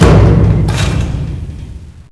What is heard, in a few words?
door stop